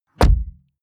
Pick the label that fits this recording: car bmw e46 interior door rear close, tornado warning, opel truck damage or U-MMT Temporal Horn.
car bmw e46 interior door rear close